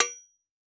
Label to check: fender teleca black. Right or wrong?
Wrong